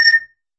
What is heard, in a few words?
Slide ping